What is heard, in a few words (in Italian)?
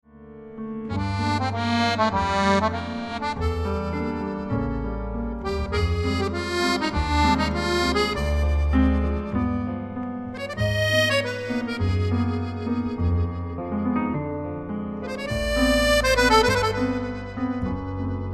quartetto